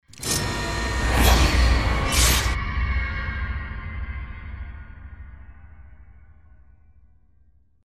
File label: Scary sting